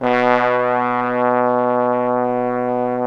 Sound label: BRS FF BON, Bone Sec.1